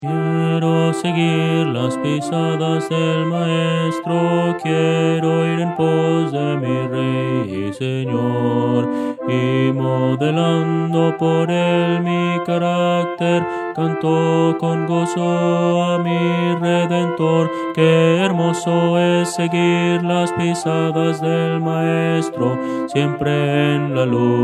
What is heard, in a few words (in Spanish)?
contralto